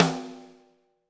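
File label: snare drum, Drums; Bob Clearmountain Drums I; SD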